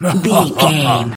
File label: voice male laugh short